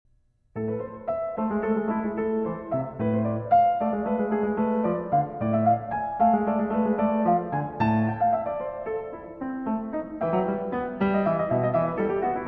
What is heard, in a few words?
Prelude in A Minor; Prelude No. 20; From the Well-Tempered Clavier Book I, BWV 865